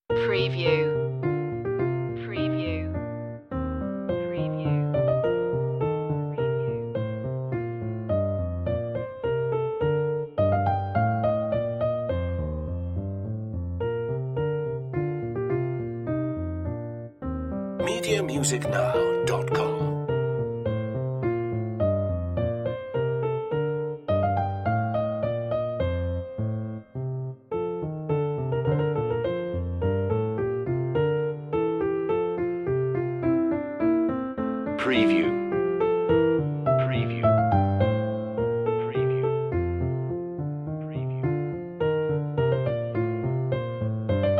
bach menuet b flat major; notebooks annamagdalena bach; bwv anh118

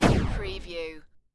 Laser Cannon Single; Sound Effects Bible

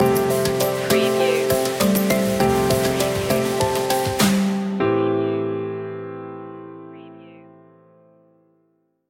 mandarin tree stinger

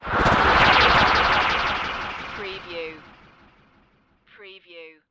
Magical Spell Cast Swell

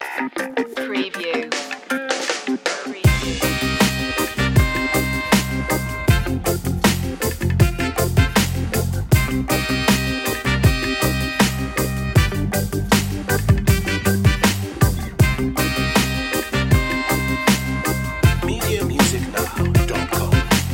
groovy jamaica

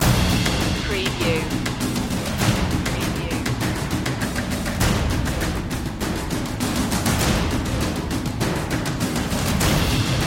percussion only

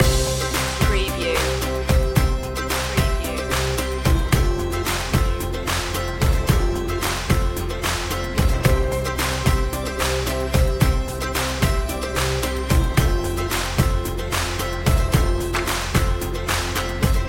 happy to help loop

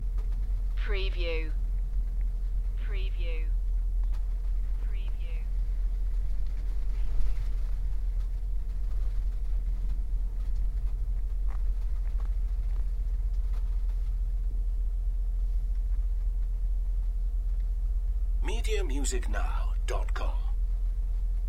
Light rain with diesel rumble loop; beanstalk audio

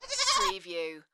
Goat Bah Baby

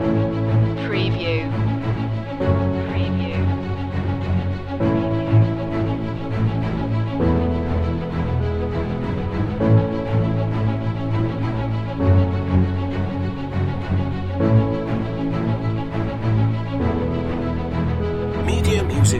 inspirational morning loop

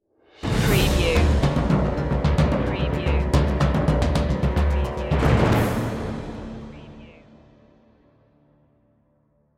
victorious arrival stinger; media music now

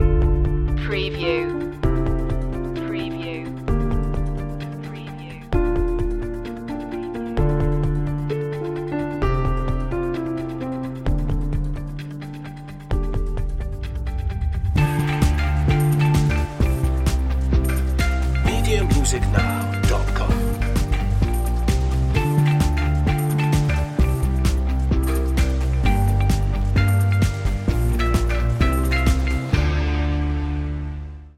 asian corporate

